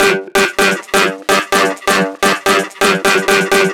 synth 128 G; Electro; Anthemic; synth loops

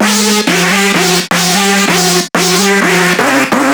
synth 128 Cm; Electro; Anthemic; synth loops

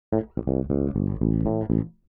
DEEPHOUSE BASS 125 A

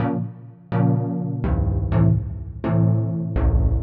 DEEPHOUSE ORGAN 125 A

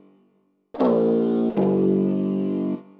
ETHNO 160 C

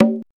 CONGA

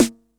909 HI-SNR, TR-909 DRUMS

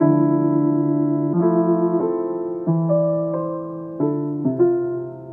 90 Dm Old Piano; Touch Loops; Textural Electronica Mini

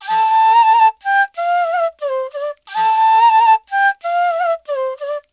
ETHNO EXTRA 90; ethno2

